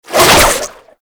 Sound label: Whip Spin Whoosh; melee; weapons; Oraxia